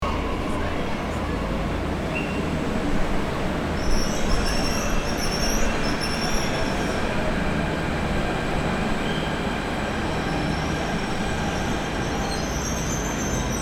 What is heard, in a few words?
Underground metro low industrial hum loop; station